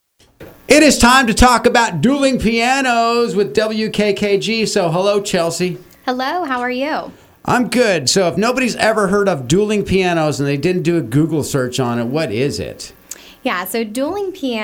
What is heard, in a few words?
dueling pianos